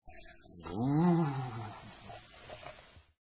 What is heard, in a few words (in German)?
buuuuh